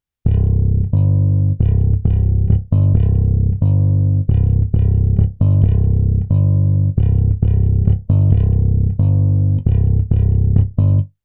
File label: A melo bass